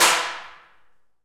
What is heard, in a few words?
PRC ALMWA, Aluminum Wall; Rhythm Section; FX Perc